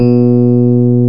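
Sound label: electric piano E3; East West; Global Sounds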